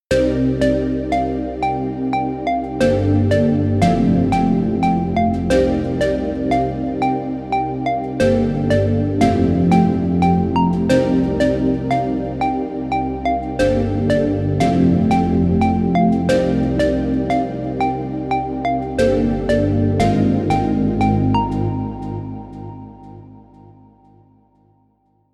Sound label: Deep Blue 89 BPM; montage assorted melodies